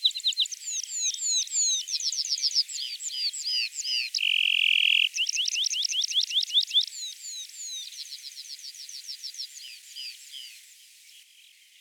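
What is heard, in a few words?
red-throated pipit voice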